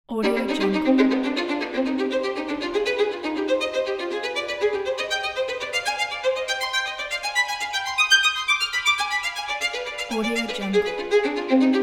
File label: virtuoso classical violin